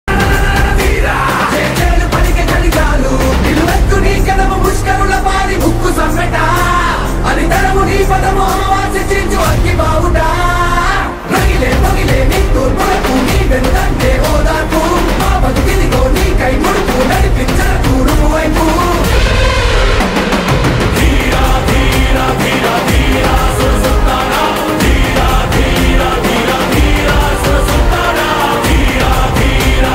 telugu ringtone

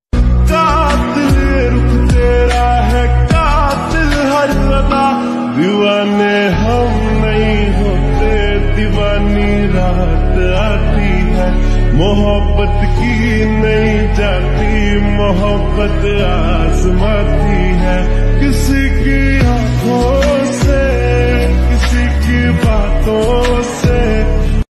lofi remix hindi ringtone

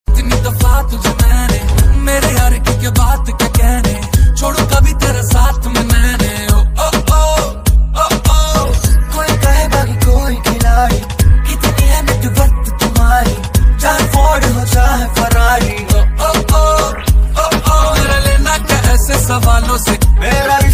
Title Track ringtone

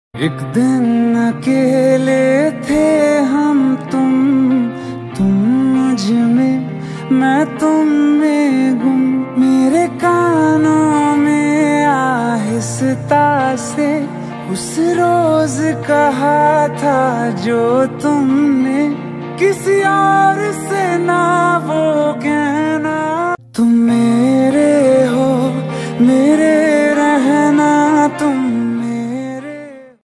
hindi ringtone

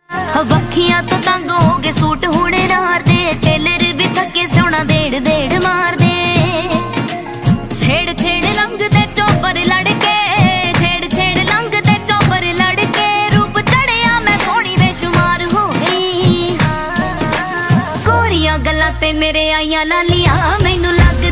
punjabi ringtone

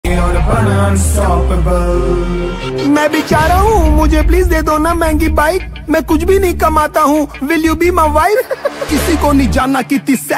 hindi rap ringtone

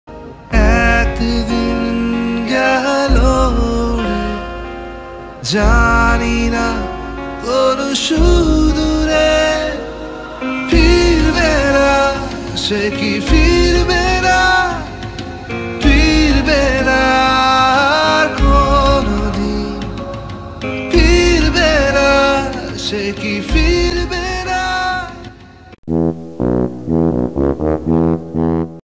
bengali ringtone

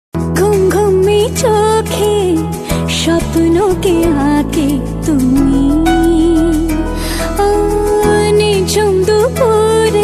bengali ringtone

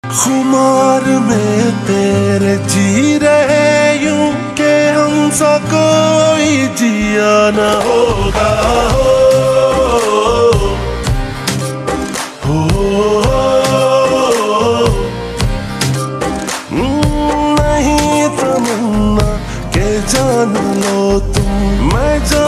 Ost hindi ringtone

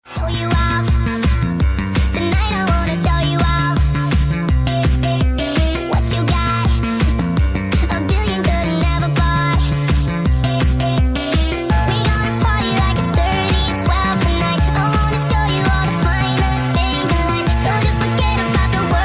speedup ringtone

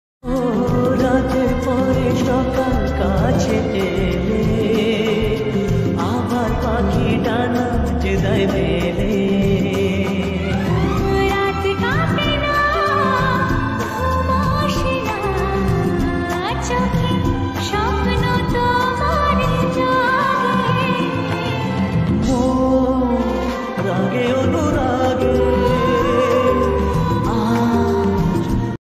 lofi, Bengali ringtone

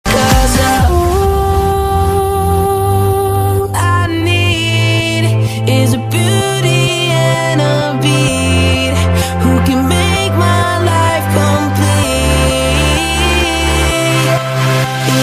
ringtone

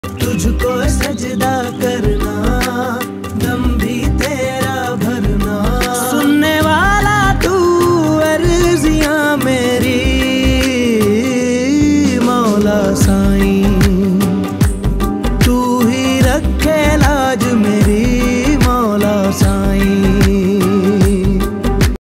OST pakistani ringtone

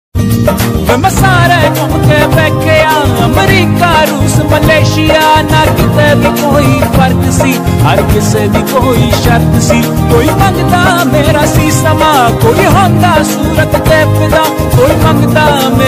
ringtone

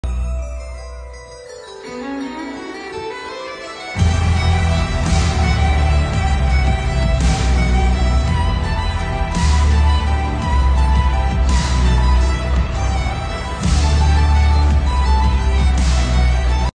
BGM english ringtone

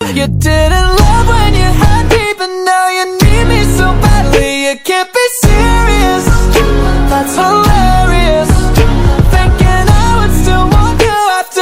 ringtone

